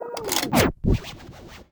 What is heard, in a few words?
dj booth stations switch; Zomboid; Lifestyle